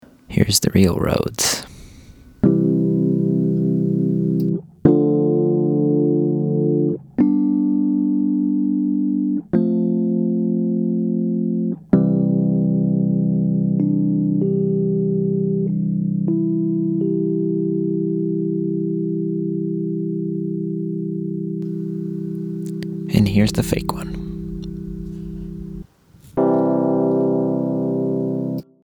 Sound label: rhodes